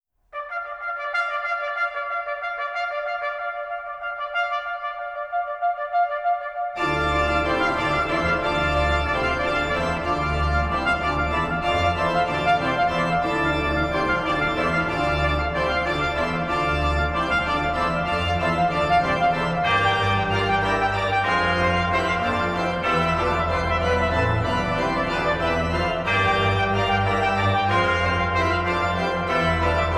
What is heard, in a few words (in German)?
Organo; Fünf Impressionen im Stift Melk: II. Organo